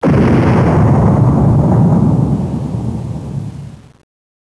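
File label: explosion Big; Chromium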